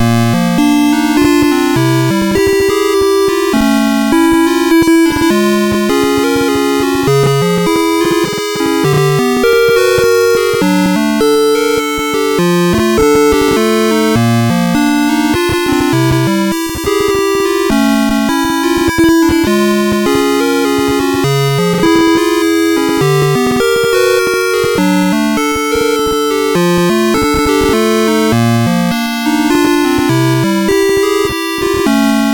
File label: Musicbox, sid MOS6581R4